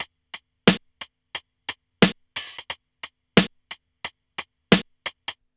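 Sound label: R&B 89 beat, hip hop R&B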